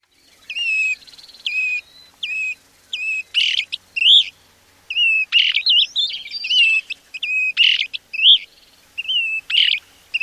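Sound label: SAYPHO aggressive calls, Say's phoebe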